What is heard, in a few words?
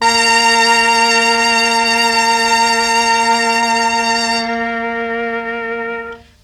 MEL STR, Legendary M400